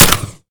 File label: mech; arccw ud